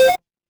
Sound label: retro game coin